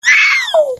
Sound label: scream, voices